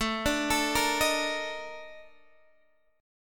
A sharp Major 11th, chord

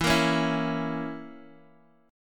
C sharp Minor 6th, E, guitar chord

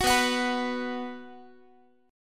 B 5th B down Guitar; B5 strummed; 4A,4E,5A,5D,5G#,6C#; chord shape; 21,19,21,21,x,x; JGuitar

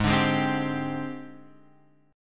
Ab Major 7th Flat 5th Ab, down; AbM7b5 strummed; JGuitar

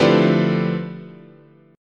D Minor 13th, guitar chord

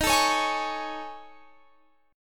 A Major 7th Flat 5th

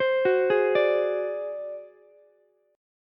D 7th Flat 5th D down Ukulele; D7b5 arpeggiated; JGuitar

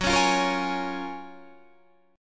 Gb Major 9th, down, Guitar, Standard; strummed; JGuitar

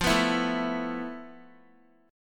F Major 7th Flat 5th, F, down, Guitar; strummed; JGuitar

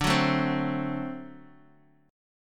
D 7th D Guitar DADGAD

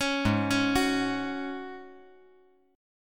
Ab 7th Suspended 4th Ab down; Ab7sus4 arpeggiated

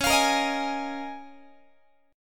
Db 6th Add 9th Db down Guitar; Db6add9 strummed; JGuitar

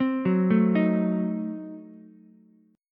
C Diminished 7th down Ukulele; arpeggiated; JGuitar

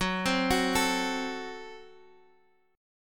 Gb Diminished Guitar Half Step Down

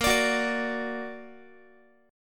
A sharp Augmented Guitar; strummed; tuning 4A,4E,5A,5D,5G#,6C#

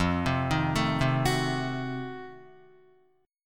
F 7th Flat 9th F down Guitar; F7b9 arpeggiated

